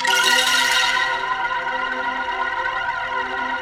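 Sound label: WATER WAVES; Pro-Rec - Synthsonic; JV FILM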